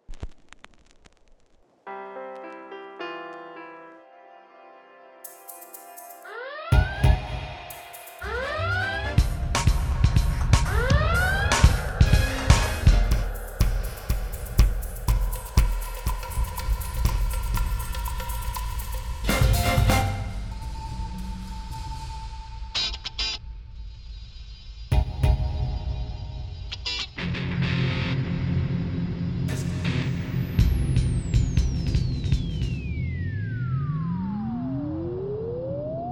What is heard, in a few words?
Reverb; 112dB Redline Reverb - Post-modern